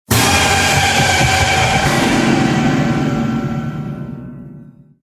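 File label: eerie sharp sound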